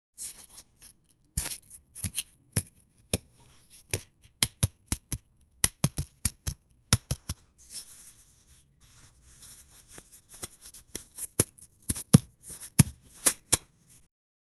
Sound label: birth